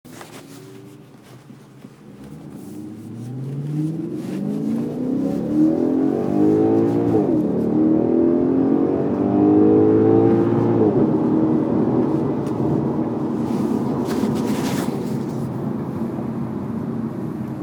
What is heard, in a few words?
Audi S5 exhaust